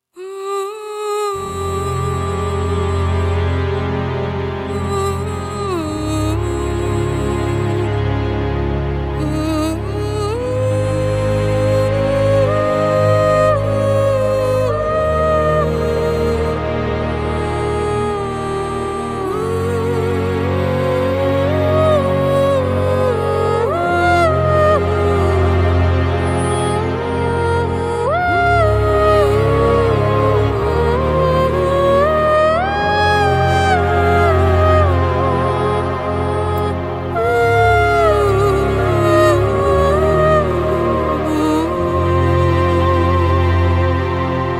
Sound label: Light on Flame, cinematic